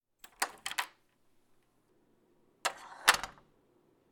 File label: Door Open And Close